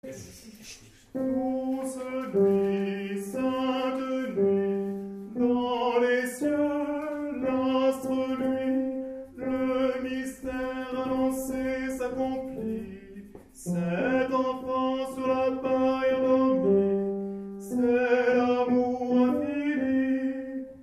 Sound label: tenors; DOUCE NUIT